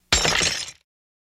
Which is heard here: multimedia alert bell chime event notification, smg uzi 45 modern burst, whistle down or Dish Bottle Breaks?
Dish Bottle Breaks